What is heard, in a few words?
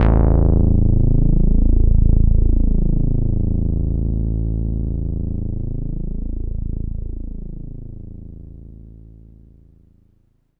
Polytaur a1, Korg Poly-800